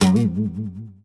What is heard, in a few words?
Harp; ChooChoo Heroes